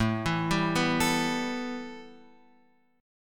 A 7th Suspended 2nd, A, down, Guitar, Open F; A7sus2 arpeggiated

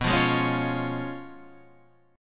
Db 7th Cb, guitar; Db7/Cb strummed; JGuitar

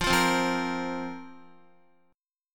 F Suspended 4th F, Guitar; Fsus4 strummed

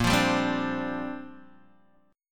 Bb 6th Add 9th Bb Guitar Open G

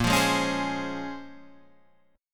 A sharp Minor 9th, down, Guitar, Standard; strummed

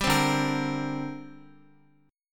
D 7th Suspended 4th Sharp 5th, D, down, Guitar; strummed; 4E,4A,5D,5G,5C,6F; chord

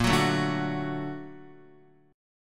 Bb Augmented Major 7th down Guitar; Bb+M7 strummed; JGuitar

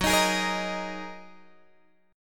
F sharp 6th Add 9th, down, Guitar, Open G

